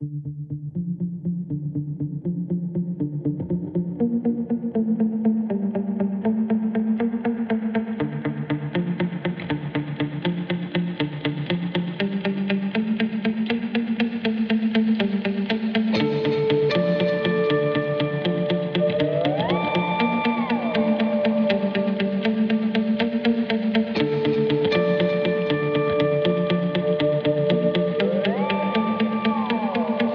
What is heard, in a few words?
Guitars